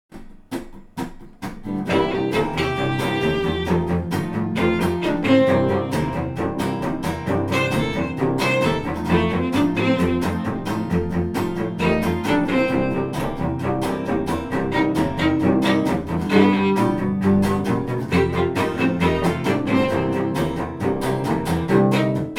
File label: Blues